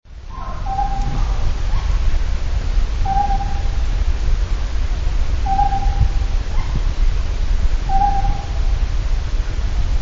ryukyukonohazuku call

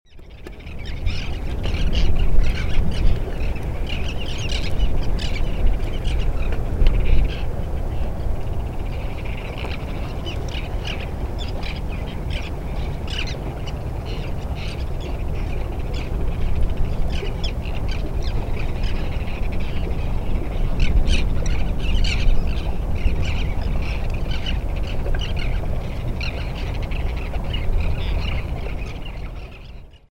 beniazisashi call

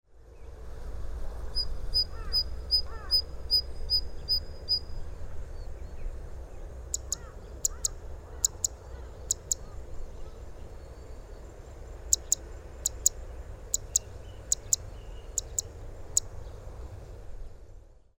sekka call

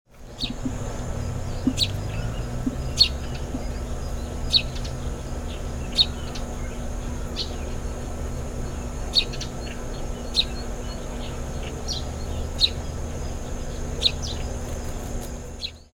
suzume call

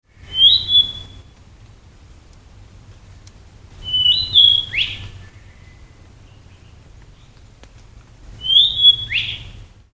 kibitaki

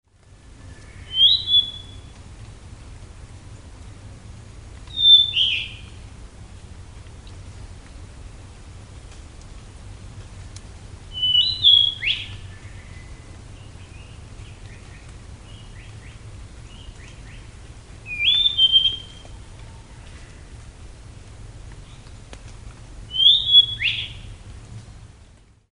ryukyukibitaki call; yanbaru

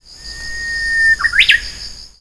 uguisu

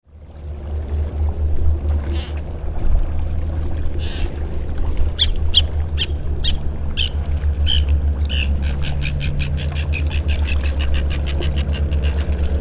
eriguroazisashi call

